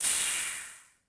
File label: gas release blast; Star Wars Obi Wan; XBOX